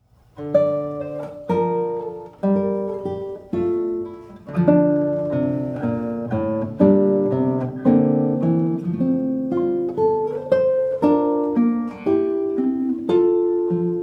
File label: Prelude in D minor; Tárrega; Prelude 1